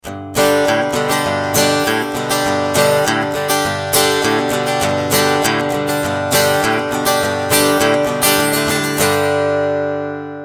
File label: Country Flat EQ High Boost